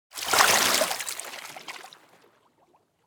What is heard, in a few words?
leapout lake; tsumire; anemoi パッケージ版